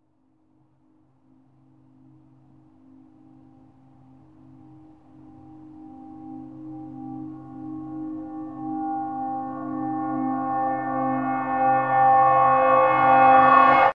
Reverse Stinger - Long Church Bell with Light Wind; Hollywood Edge Sound Designers Tool Kit